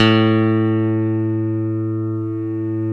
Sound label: ELEC GRAND; OMI - Universe of Sounds